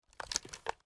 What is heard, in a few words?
ak47 inspect; Tacz1.1.5+; RSWS 1903